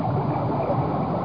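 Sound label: ambient cave; dosgames; abuse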